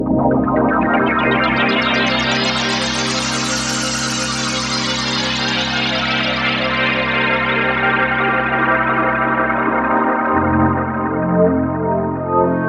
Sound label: Sparkle Pads; Minimax; dinopark